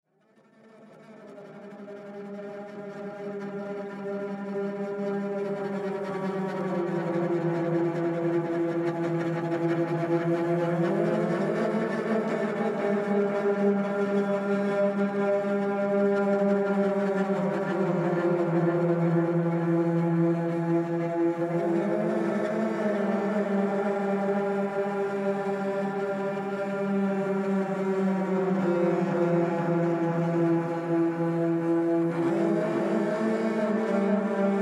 Bad Cello

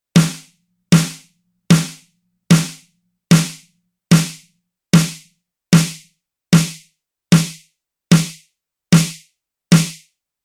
Parameter Muffling

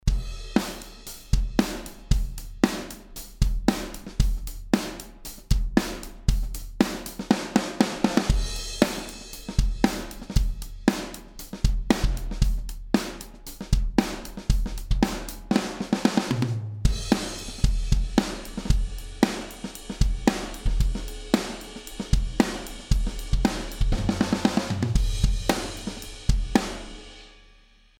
Snare Trig Tama Bell Brass

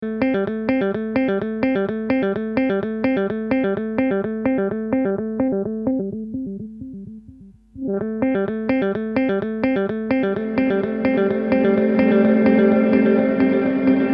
filter reverb mod; hologram electronics microcosm

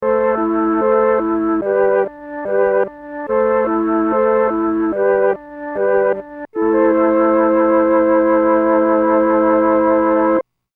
Mellotron Flute

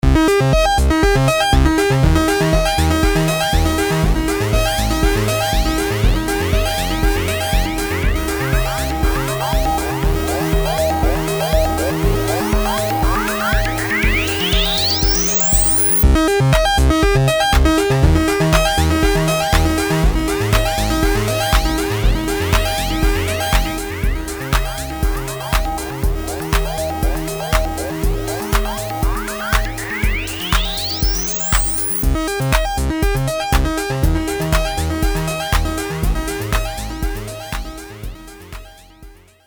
Seq Arp Motion

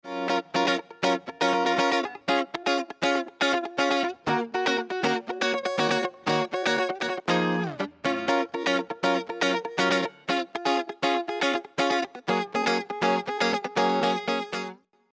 Guitar Dry; CHI SRE330

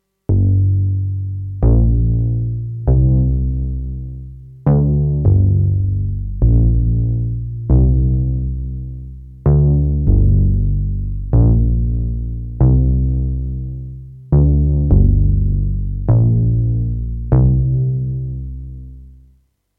Push Bass

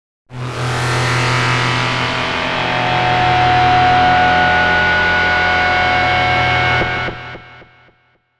Absynth Guitar Feedback

